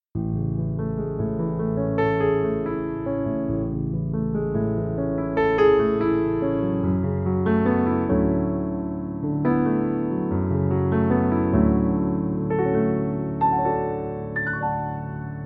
Piano Version

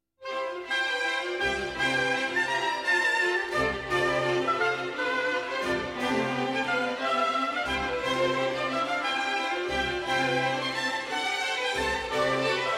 Orchestral Suite in C major, Forlane; No. 1; BWV 1066

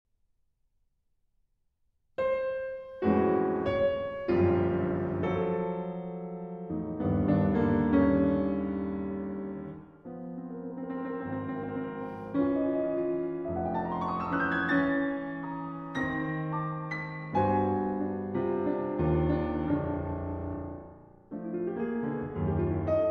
Piano Suite, Rapsodie. Molto moderato; No. 2, AK 72

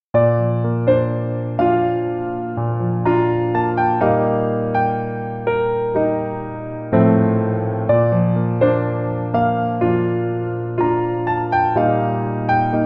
Instrumental

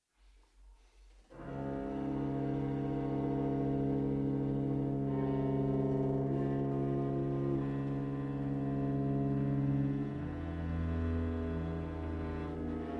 String Quartet No. 4; Contours III. Mýlingur

